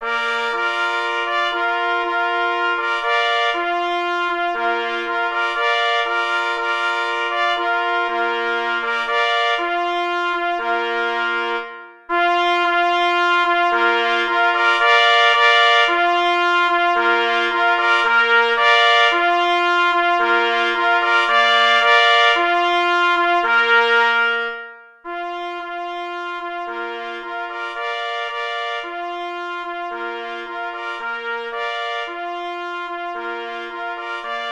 Fanfara kynologiczna na 3 plesy; Erwin Dembiniok (1921-2013) – międzynarodowy sędzia kynologiczny, myśliwy, hodowca fousków

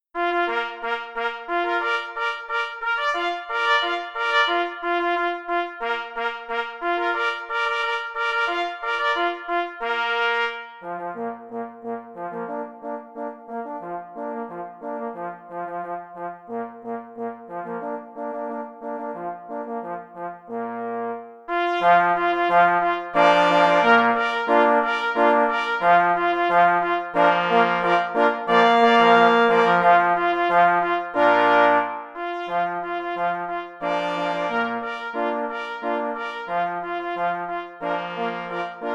Marsz na 2 plesy i 2 parforsy; J. Rosner; Marsz pszczynski nr 4

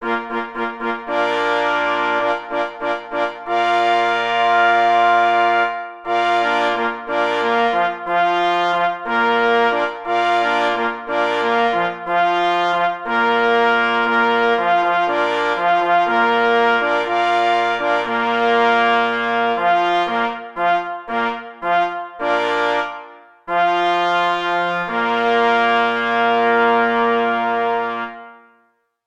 Hejnal na 4 plesy i 2 parforsy; Cz. Trybus; Hejnal strzelcow; wersja oryginalna

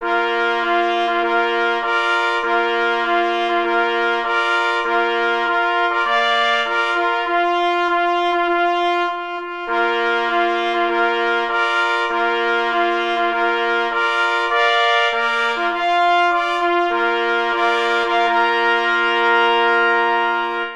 Hejnal na 3 plesy; K.E. Kroskowski; Hejnal Lowiectwa Gdanskiego

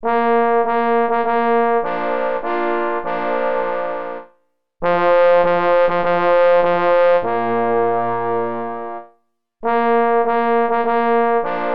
Marsz na 3 parforsy; K.M. Kadlec; Marsz na wprowadzenie pocztow sztandarowych

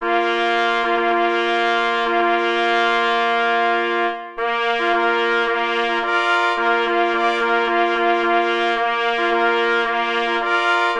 Fanfara, na 3 plesy; B. Kopera; Fanfara Klubu Jamnika PZL; pies w muzyce lowieckiej

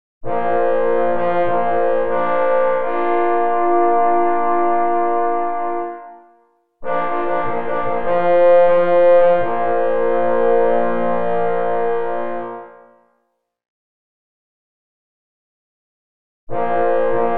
Sygnaly okolicznosciowe na 3 parforsy, na 3 rogi myśliwskie; Tusz nr 1; Tusz nr 2; E. Kruszelnicki; Eugeniusz Kruszelnicki (1933-1996)

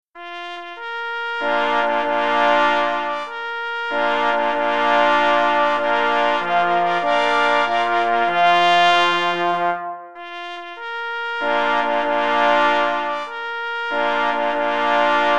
Hejnal na 3 plesy i 2 parforsy; Hejnal PZL

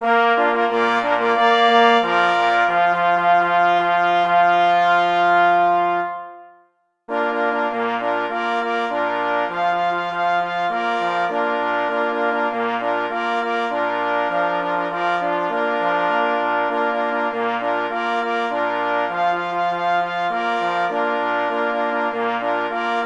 Marsz mysliwski na 2 plesy i 2 parforsy; R. Idzikowski

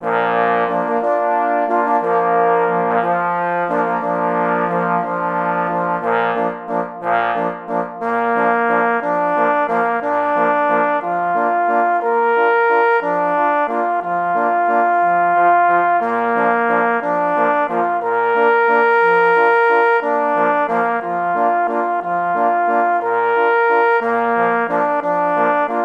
walczyk na parfors solo z akompaniamentow 3. parforsow; Poznanski

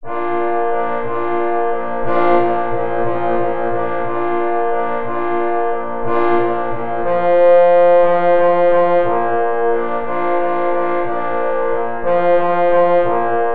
Capstrzyk mysliwski na 4 parforsy

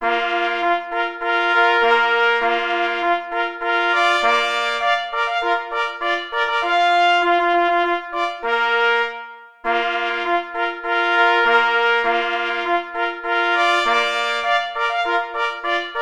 Marsz; J. Rosner

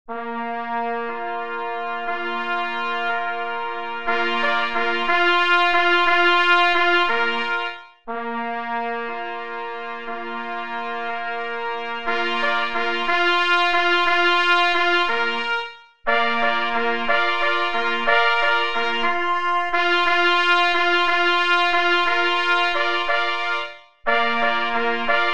na 4 plesy; W droge mysliwi glos trabki plynie

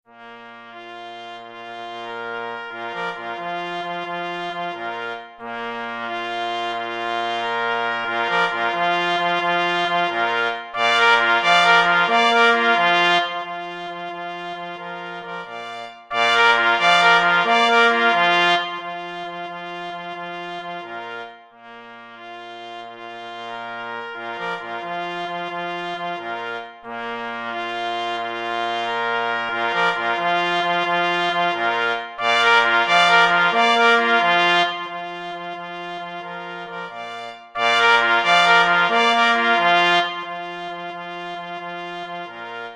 na 2 plessy i parfors; W droge mysliwi glos trabki plynie

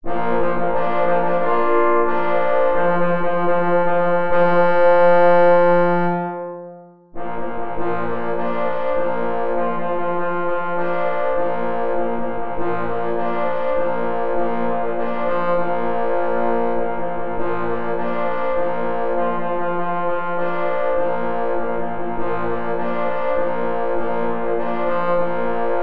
Marsz mysliwski na 3 parforsy; R. Idzikowski

